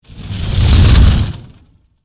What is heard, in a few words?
Fireball Throw, Fire, Super, Hero; Flame Sound Effects; BlastWave